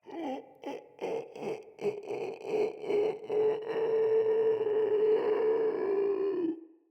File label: Infested Runner Patrol